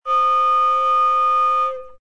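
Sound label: C5 with D sharp 6, flute multiphonics; modern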